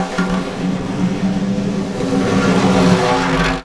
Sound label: Snare Drum; Percussion Samples